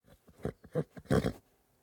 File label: horse grunt; teardown; ps5 windows xbox series xs